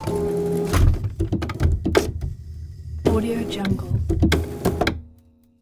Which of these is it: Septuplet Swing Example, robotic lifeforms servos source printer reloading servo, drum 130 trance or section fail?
robotic lifeforms servos source printer reloading servo